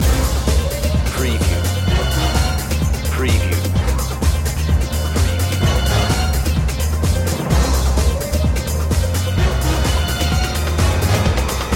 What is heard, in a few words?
sym groove loop